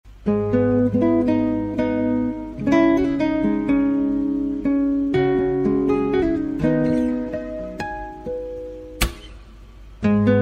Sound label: Guitar; Pathaan